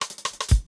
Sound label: Cross stick Fill 90 bpm, drums; BASEMENT DRUMS VOL.1